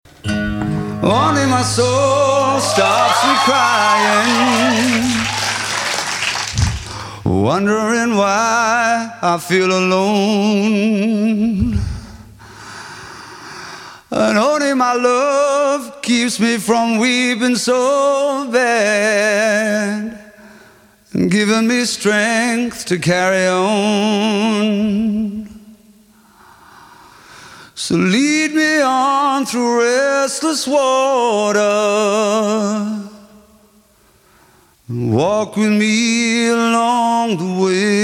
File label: Acapella, Live